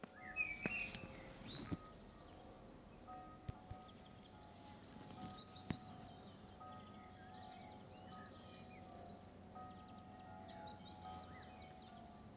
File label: Bells; Wornal